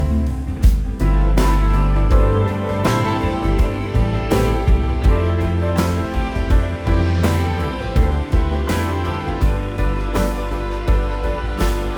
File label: loop Funk Groove Guitar in A minor bpm 90